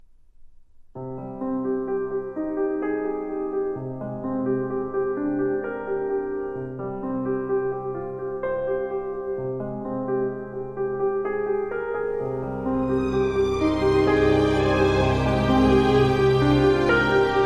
Only Piano & Strings

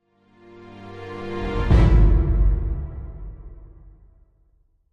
Short Hit Sting Medium Tension

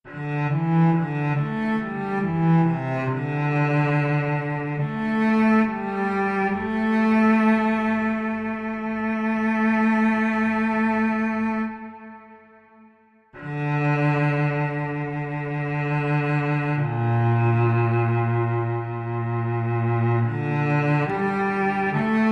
Cello Only

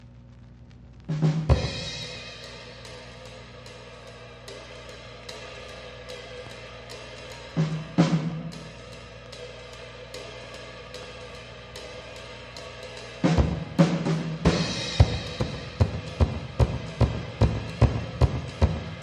Drums

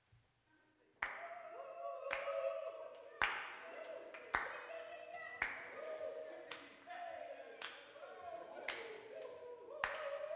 Jazz Club

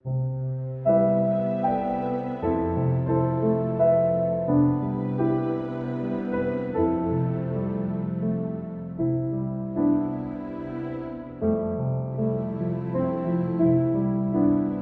With Strings

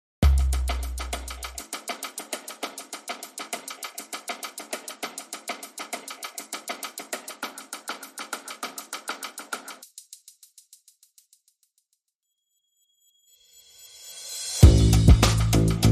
Drums and Bass

